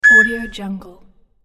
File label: oven microwave beep; hollywoodedge